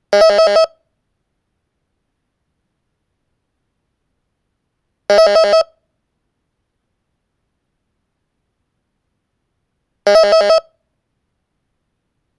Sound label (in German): batteriekontrollton; xp; 4mal